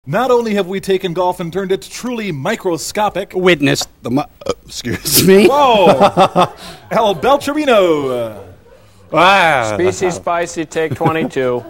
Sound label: belch